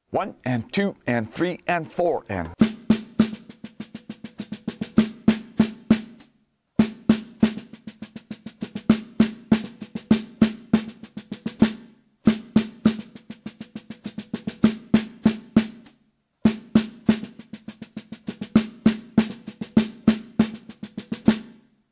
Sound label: Melody 16th Notes